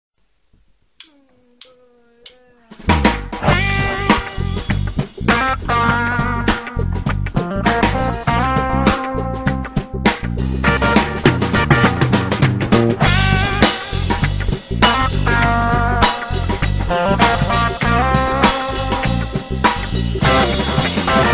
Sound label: funk